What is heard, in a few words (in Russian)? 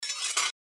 V nozhny mech